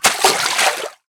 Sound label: water splash; Fishing2